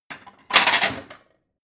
plate multiple shelve hard

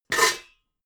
pots clank hard

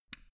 switch small turn on soft

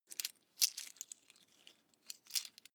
coins clatter soft

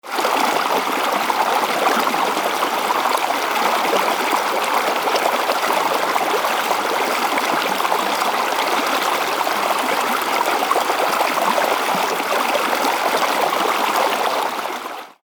stream strong

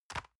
envelope drop multiple medium

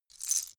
lego bricks small handling single

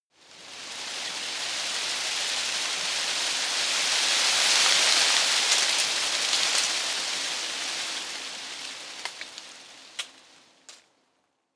tree foliage rustle medium

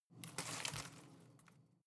home plant leaves rustle short

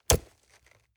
nylon leather hit hard

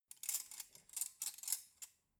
cutlery forks handling short

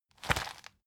plastic bag hit medium

